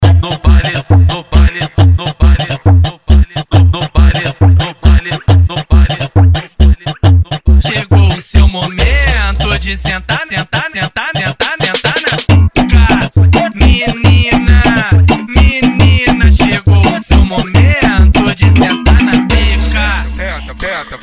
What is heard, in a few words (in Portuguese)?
funk ritmado